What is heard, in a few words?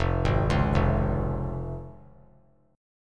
F Minor Sharp 5th, chord; Fm#5 arpeggiated; JGuitar